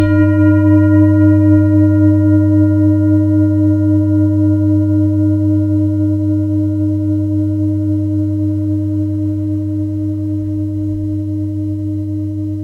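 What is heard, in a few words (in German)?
Klangschale Tibet Tageston; Planetenschalen; Erdentoene; Klangproben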